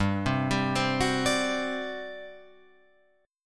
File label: G Major 7th Suspended 4th, G, down, Guitar, Open F; GM7sus4; arpeggiated